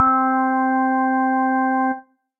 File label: Piano & Keys